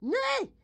grandma short yell; Blind Drive

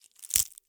CRACK Bright Crisp; mono; Universal Sound FX; BREAKS & SNAPS

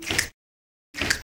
finger snip fx; best service Blue Box; percussive fx

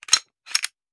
Hand Gun Foley; Sound Ideas; Guns

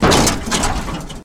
shoulder general hit; Blind Drive

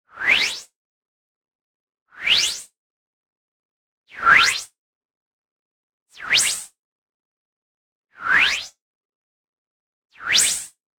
WHOOSH White Noise Short Resonance Ascending; boom library; Anime Essentials

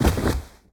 Bodyfall Carpet; SFX Kit; Bodyfalls

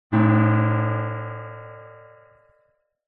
Metal Pan Impact Hit - Large Baking Tin; Hollywood Edge; sound designer's toolkit v2

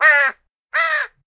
crow call; DeadFright

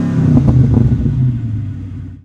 Mustang Rev Down; Automobiles

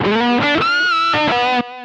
Pickup Guitar Lead Riff; Pickups

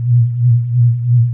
Sci-fi Hover Bot Loop; SFX Kit; Sci-Fi Loops

